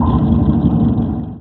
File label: select submarine